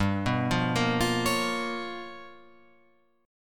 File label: G 7th Sharp 9th Flat 5th, Guitar, Open F; G7#9b5 arpeggiated; JGuitar